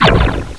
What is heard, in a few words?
Fire Bullet Flash Sound; freedroid